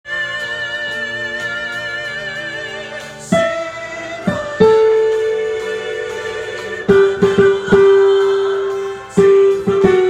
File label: Alto -